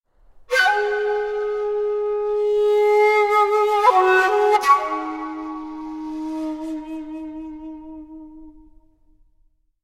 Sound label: Japanese sms